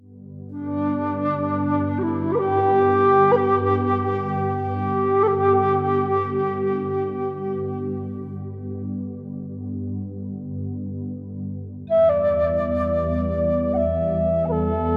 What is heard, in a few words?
Music for Relaxing Cats